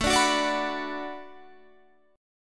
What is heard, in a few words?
B-Minor